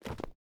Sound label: mobs landings